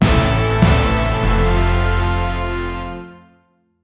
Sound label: short epic mission comple, fanfare